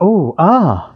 "ooh ahh", voice

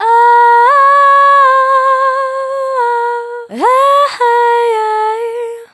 voice grunge; Loops; Sad

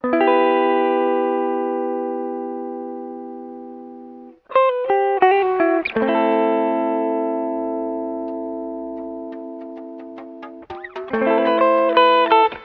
guitar, Phonky loops

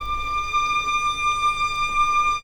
rinse, String

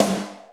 snare, Real

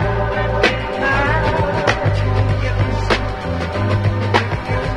keys, phonky loops

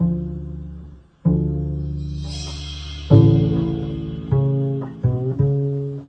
bass, Loops

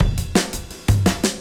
Drum loops Funky

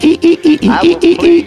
eee eee eee eee eee eee eee, Voice

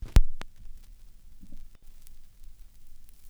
crackles, Vinyl

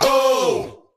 "oh" sung; ooh ahh

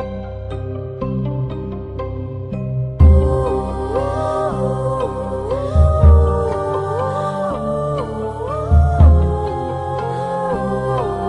strings, sad

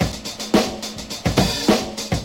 loud fuzz, funky drum loop; Drum loops

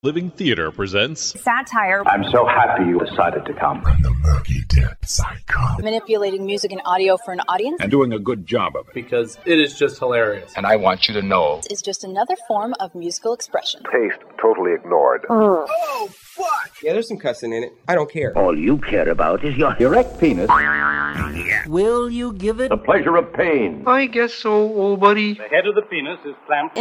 Variations on Fart Noises

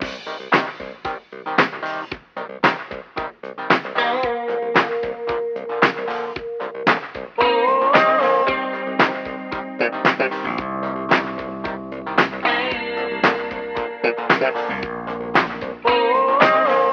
keys and guitar, phonky loops

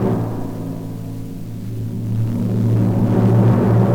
roll, Misc Percussion Tympany

